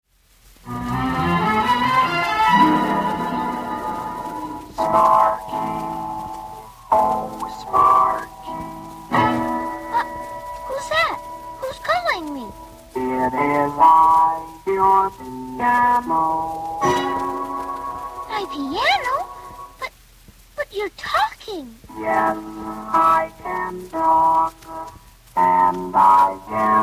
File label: piano; 17sparky